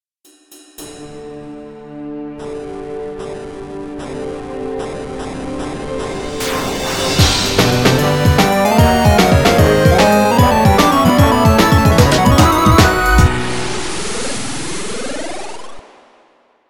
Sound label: Victory Dance (Dungeon Clear)